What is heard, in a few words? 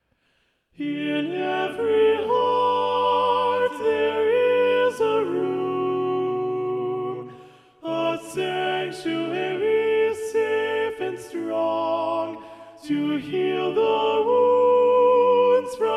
Soprano; Practice Room